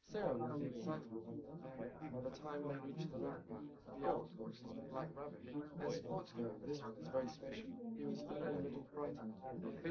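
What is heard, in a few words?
male, live recording 250ms 5cm; SiSEC11; underdetermined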